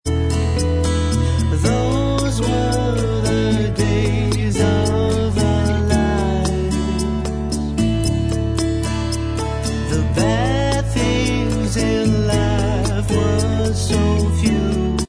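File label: backing vocals, backing track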